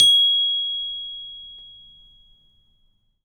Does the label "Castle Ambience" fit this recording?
No